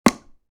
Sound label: Dartboard impact dart hit; Darts